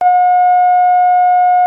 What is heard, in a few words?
bleep